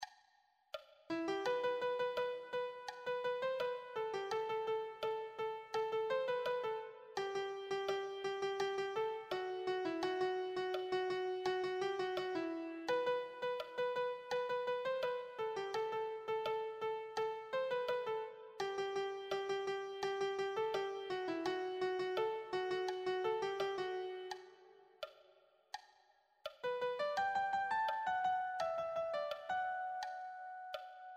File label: melody